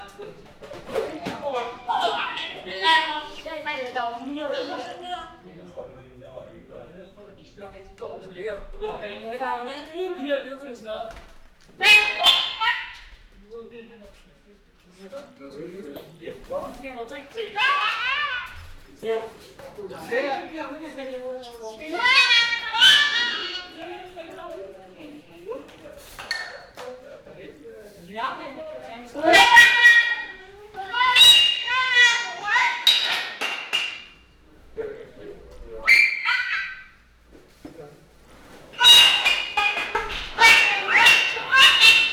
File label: a man and a woman, breaking glass